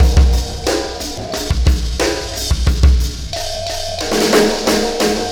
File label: System Overload Beat, Processed Drumkits